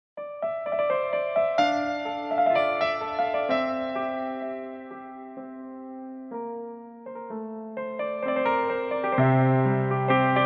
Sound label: Piano Version